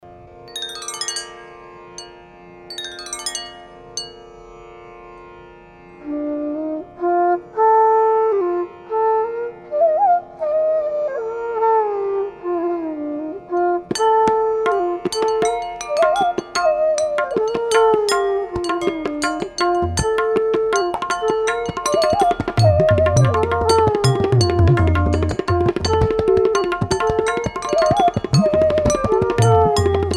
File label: rageshshri with bansuri